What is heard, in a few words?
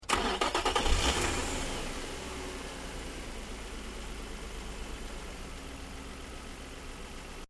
CAR NISSAN 350Z ENGINE BAY STARTUP, manual sportscar; Cars (Sound Effects:Vehicles)